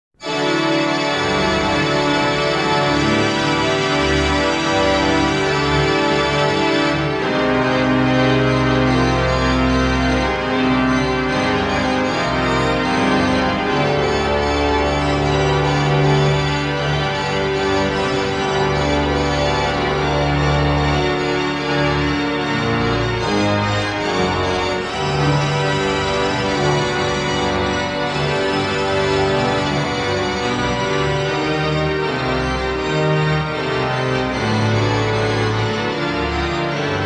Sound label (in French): Improvisation sur Il est ne le divin enfant, orgues; Marie-Claire Alain; St Merry; Disque Erato